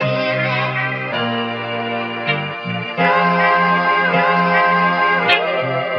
guitar and vocal, Phonky loops

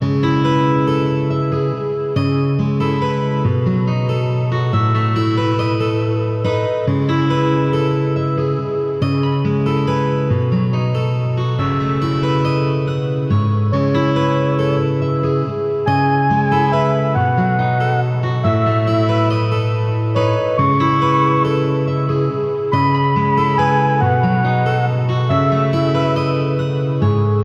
guitar and keys, Loops, Sad

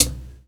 Real Closed Hats

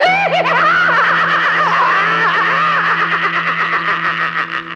laugh maniacal; ooh ahh

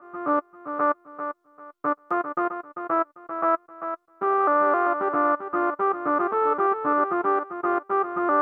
square lead; Loops; Electronic

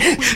breath; ooh ahh

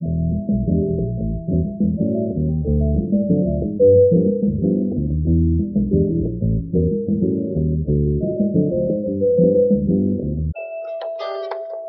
vibes, loops, phonky

